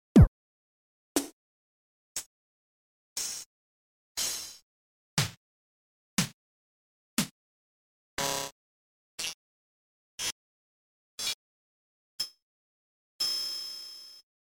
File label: electric Drums Instruments